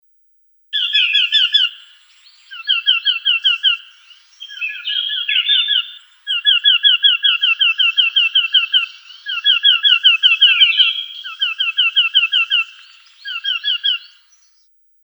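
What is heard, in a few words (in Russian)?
Popolzen ryzhegrudyj, Chestnut-breasted Nuthatch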